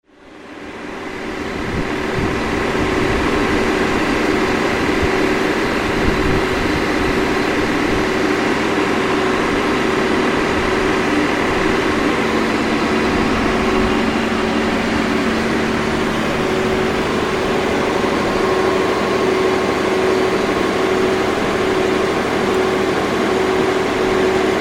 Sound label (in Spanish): generador; santa cruz; el chalten